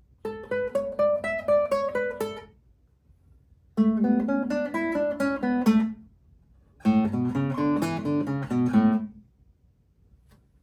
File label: Fingerpicking